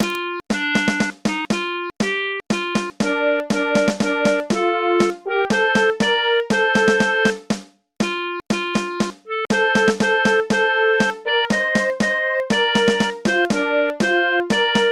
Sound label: South Africa flag anthem, MIDI